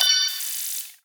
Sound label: Computer alerts; SoundBits